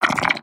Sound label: spitter call, creatures